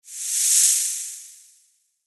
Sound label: snake hiss